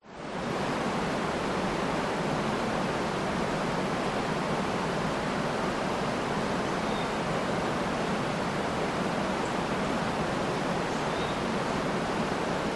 nature creek waterfall system 40m distance forest birds